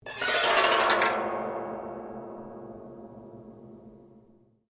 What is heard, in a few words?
HAMMER DULCIMER EFFECT STRUM DOWN, Old Chinese Hammer Dulcimer; Strings; Musical